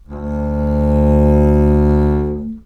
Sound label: bass long